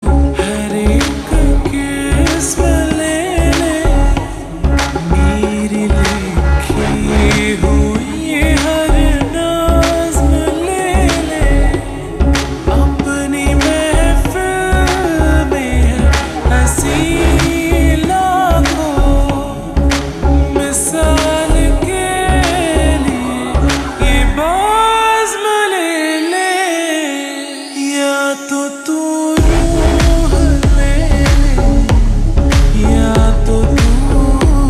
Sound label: Slow Reverb Ringtone